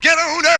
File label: VOX; ZERO-G; Datafile